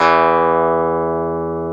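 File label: E 12 String guitar; Club-50 - Foundations Roland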